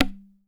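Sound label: INDIAN MIRD, ETHNIC SET; Voice Crystal - Steve Reid Definitive Percussion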